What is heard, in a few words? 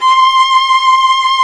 String Vlas Sect Agitato; Vas1 Agitato